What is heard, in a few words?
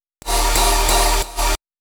huge stacks